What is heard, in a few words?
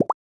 notification pop